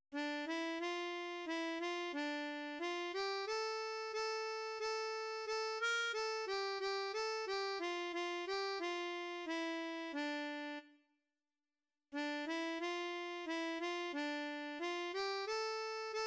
Trois petits chats, harmonica

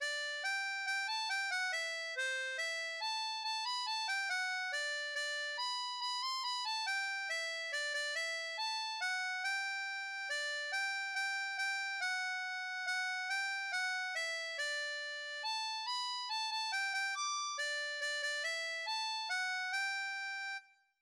We wish you a Merry Christmas, harmonica; Sol

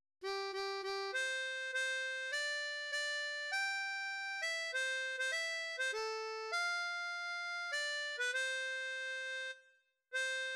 La marseillaise, harmonica

